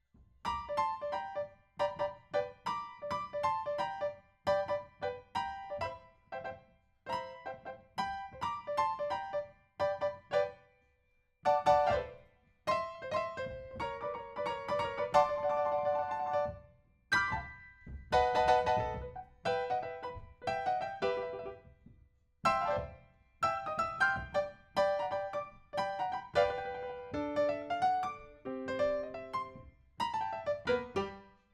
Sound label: PIANO, RNR for piano